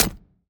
boomerang hit

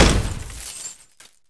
chain break

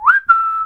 ding whistle whistle

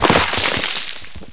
rock crumble

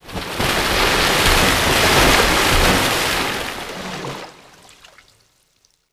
water thrashing reverb; boss2

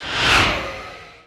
beast ghost dash

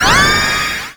power up

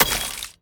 kick

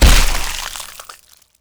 maggot wrist explode; finalmother

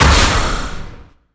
summon sound

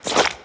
jump; gish

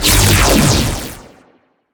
laserring strong

